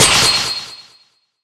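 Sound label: Se Effect Shield; Etrian Odyssey I HD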